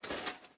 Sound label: Mech Footstep Texture Movement; Starbites